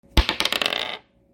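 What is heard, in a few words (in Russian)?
одиночный кубик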